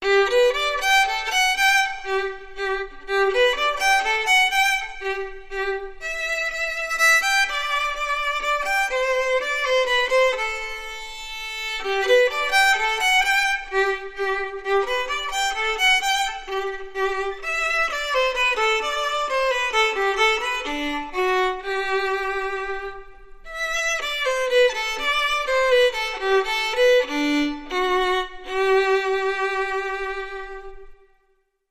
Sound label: Classical Violin Solo